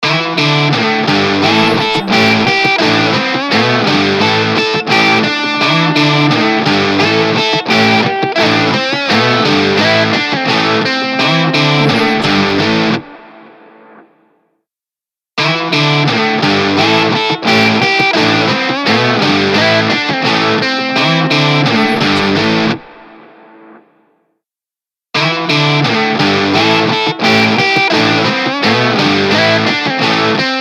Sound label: guitar rock logo